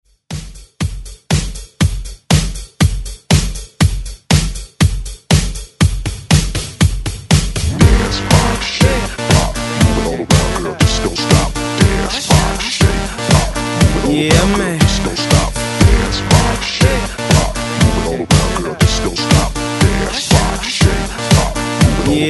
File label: ReDrum 120 Bpm; remixplanet